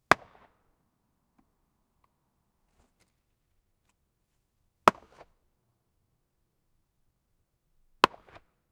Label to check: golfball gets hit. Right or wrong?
Right